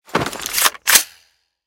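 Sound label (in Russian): оперативная перезарядка пулемета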